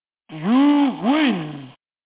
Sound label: Retro Videogame Win Announcement